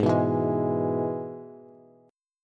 Ab Minor Sharp 5th, Bass; Abm#5 strummed; JGuitar

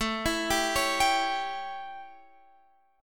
A 7th Guitar Drop D, chord; x,12,14,12,14,15

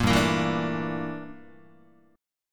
G Minor 6th Add 9th G down Guitar; Gm6add9 strummed; JGuitar

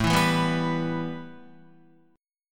A 7th Suspended 2nd A, Guitar; strummed

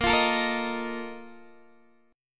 A sharp Major 7th Suspended 4th, Guitar, Open D

